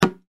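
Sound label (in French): sanglier m ferme; Mono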